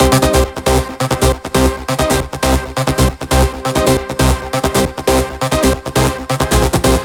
Melody 3, 136BPM; Alone Melody 3

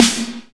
Snare, trap

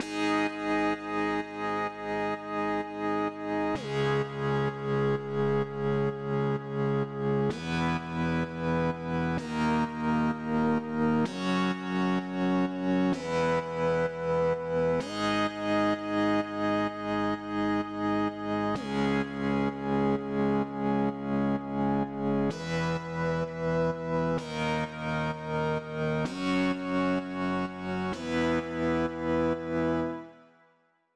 128BPM Lead Root G# SC; Zeta; Vengeance Samples; Zeta Kit

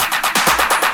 Fill Ins 128BPM; Vengeance Samples

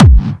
BD Punchy; Vengeance Samples; Bassdrums

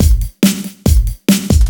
Loops BB 140, BreakBeats; Vengeance Samples

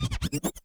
Transform Med, vinyl record cuts longs

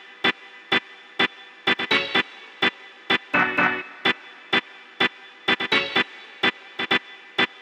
126BPM Cutted; Vengeance Samples; Tech Freak Kit

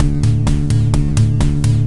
VFX2 Loop 128 BPM

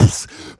Beatbox Snare, Human Beatbox; Vengeance Samples; Special Sounds

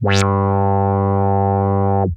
Arp D G2, ARP Odyssey, synth samples; musicradar-synth-samples

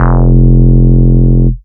Root F, Bass

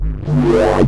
Acid Sounds G, Synths; Vengeance Samples

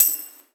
Open Hihat; Vengeance Samples; VEE Cymbals

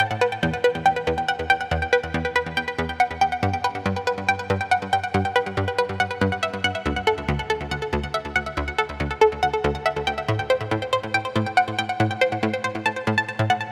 Pluck Melo WET 140BPM, Eminor; Melody & Synth; Vengeance Samples; Incast Kit

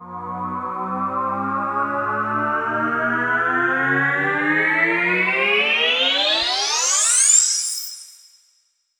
Uplifter

